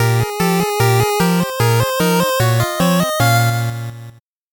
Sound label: 8bit jingle slow